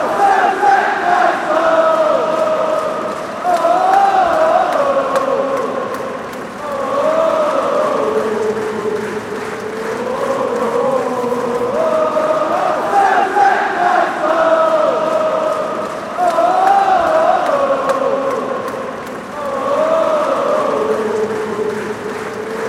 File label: fan chants